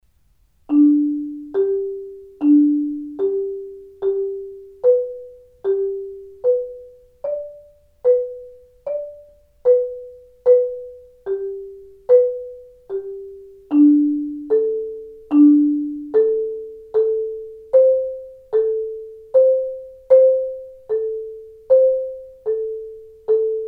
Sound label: mallets